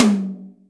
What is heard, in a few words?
Room, Acoustic Tom; Yamaha DTXpress